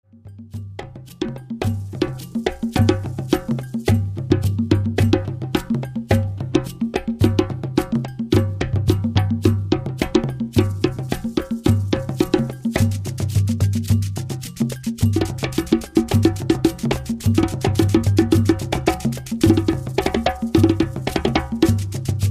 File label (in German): die trommel ruft zum tanz; tanzfest